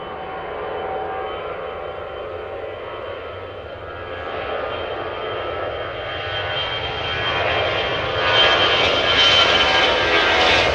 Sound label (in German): Flugzeug